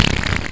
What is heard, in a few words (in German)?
FURZ